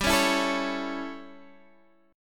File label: A Major G, Chord